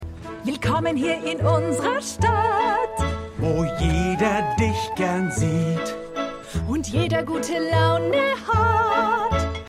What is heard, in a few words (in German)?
Frau Gesang Mann Gesang Frau Gesang; Schmigadoon